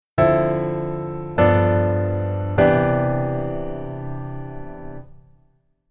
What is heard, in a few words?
Keyboard Voicing